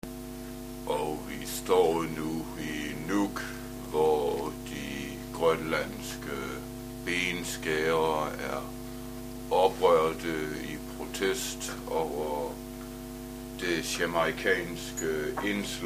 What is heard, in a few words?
reggae